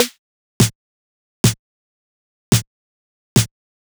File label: drum jacker percussion 125, Drum Loops, Classic Chicago House